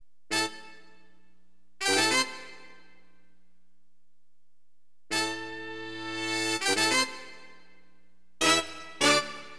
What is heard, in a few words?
FNK HORN, Acid Jazz Funk, Wind; LiveAct Pool